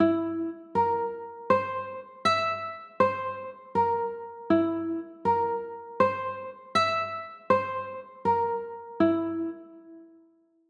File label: c 6, chords